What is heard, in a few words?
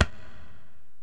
Korg x5, Drums